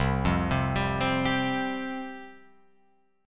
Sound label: C Suspended 4th C, Guitar Open F; Csus4 arpeggiated; 0,0,0,2,3,2; JGuitar